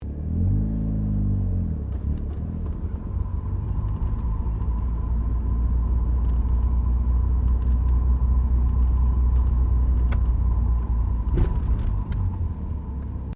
Car Mazda Rx7 1983 Series3 Interior Maneuaver Slow Steep Hill; Cars; Vehicles